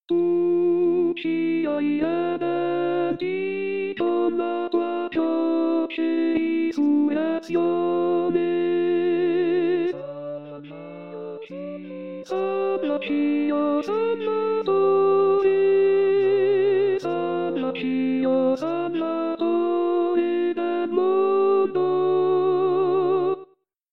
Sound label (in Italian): Contralti; pasqua